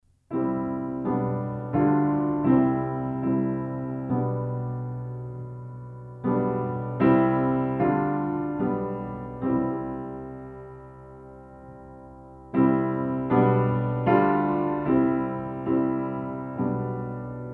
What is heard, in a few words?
Piano